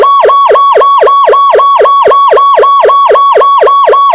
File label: whoop